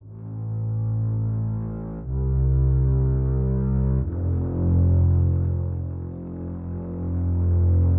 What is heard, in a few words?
Bass Loop (120BPM); cinematic drama; Loops; musicradar